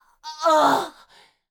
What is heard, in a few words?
Death